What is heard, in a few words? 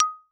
Xylophone rosewood ff Eb6 stereo; MIS Pitches - 2014; Percussion